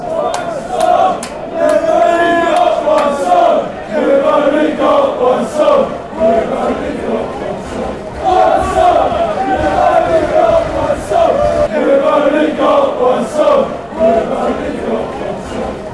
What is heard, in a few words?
you've only got one song, ipswich fanchants